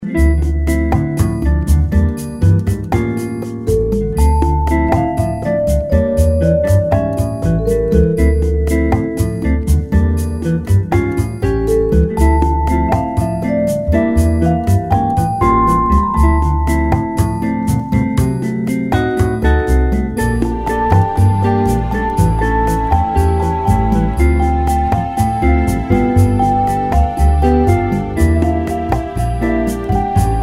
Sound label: Bossa Night, Music background pads